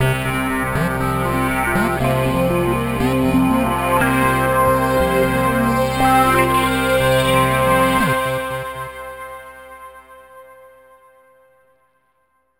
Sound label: Title Chime; Factotum 90; PS Vita